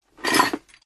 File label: metal socket movement mechanical gear mechanism accent; ancient game